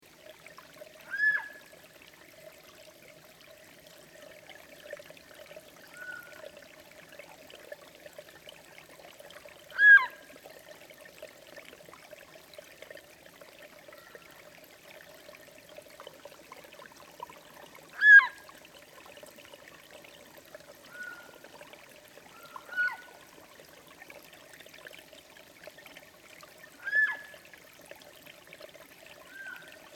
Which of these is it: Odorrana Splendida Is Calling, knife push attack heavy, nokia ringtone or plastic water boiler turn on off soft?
Odorrana Splendida Is Calling